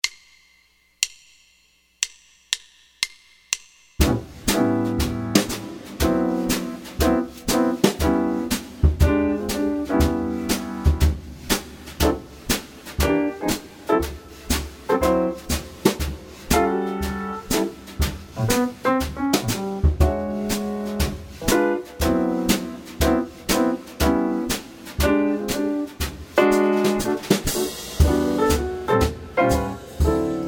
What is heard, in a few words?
Blues Bass Backing Track; Blues Bass Challange